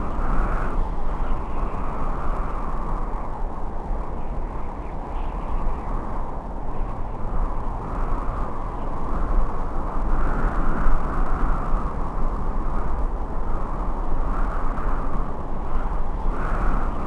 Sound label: wind, ambience